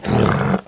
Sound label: bear pain